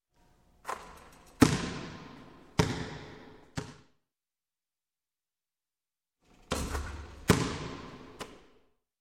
Basketball Sink Basket; Sound Ideas 2000 Series

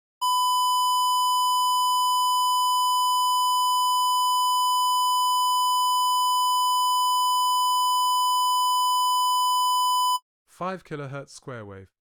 1kHz square wave @ -20dB FS; Alan Parsons & Stephen Court - Sound Check 1993

